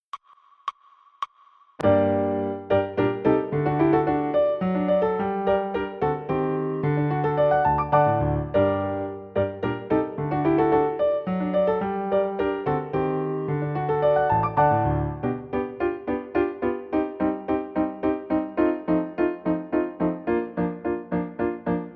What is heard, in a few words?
courante; eccles sonata; no repeats